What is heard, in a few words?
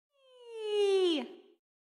Slide Exit; Jackie